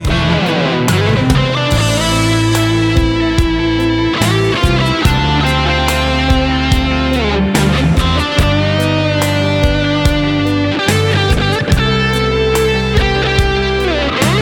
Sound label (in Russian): Гитарное соло